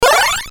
magic collect

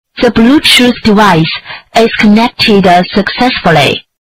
songs; kurdi

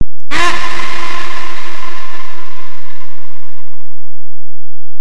ahhhhhh sound effect

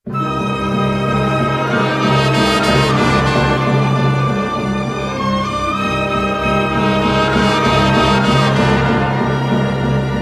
dramatic cue

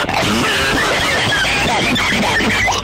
huge cough

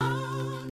awhhhhhhhhh

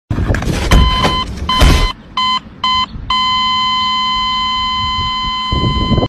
death sound; gmod

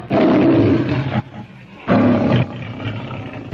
roar; mgm

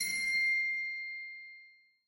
ding; flowey

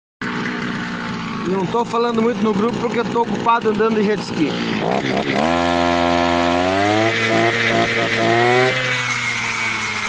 jetski

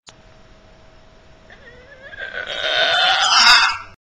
oaaa

